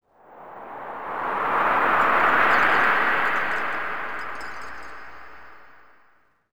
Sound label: city occasional